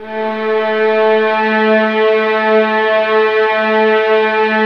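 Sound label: violins B3, Synclavier Strings; ILIO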